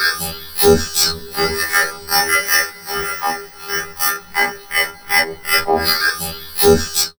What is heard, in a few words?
VOCOD, Drum Loops Crazy Processed